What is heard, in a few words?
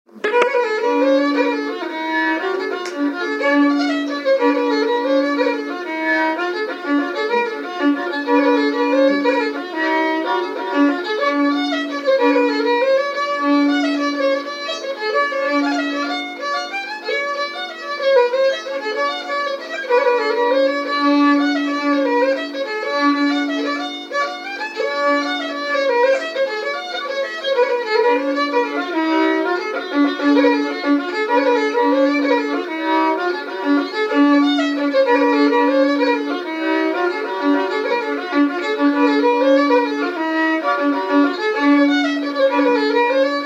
jig; The Cordal